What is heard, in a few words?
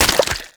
Run Sludge; Footsteps; Warframe; Tenno